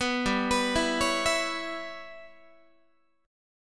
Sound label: G 6th Flat 5th G, Guitar; G6b5 arpeggiated; 14,15,14,14,17,15